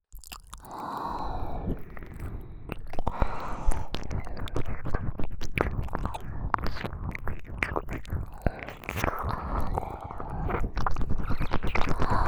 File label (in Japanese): 耳舐め, ASMR; サナ; 怖ギャル